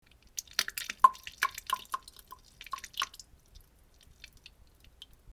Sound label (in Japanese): 下痢からなんべんになりかけ： びちゃじゅじぉっ（びちゃ ちゃ ちゃじゃちゃ）じゅじゃ（ちゃ）